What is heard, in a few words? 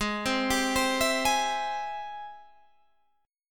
G sharp Augmented Major 7th G sharp Guitar Open G